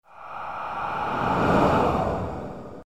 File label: ghost breath; Страшные звуки для монтажа